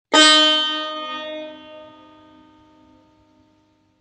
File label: tar, "mi" b